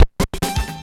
SCRATCHES

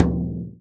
BASS, INDIAN PERC

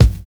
BD, BASS DRUM, 70's Breakbeats

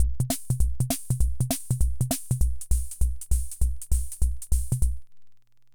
Hammond Auto-Vari 64 Loop, drum machine; Hammond Auto-Vari 64 Ableto Project; 300 Drum Machines

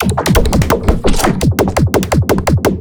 Percussion Loop; M8; percussion and foley

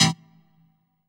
HOUSE, House Dance

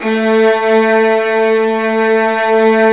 Vas2 Bright; Roland L-CD702; Vlas Sect1-2

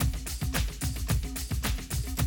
110, Classic Drum Loops

